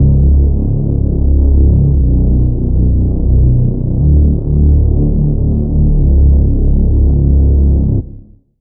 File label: Reese; Vital Expansion; Street Vanquisher Vol.1; Routed Sound